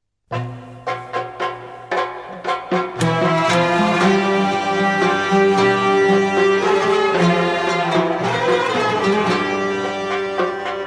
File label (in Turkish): Hüzzam Saz Semai